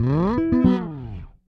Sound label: Slide E, Bass; Bass X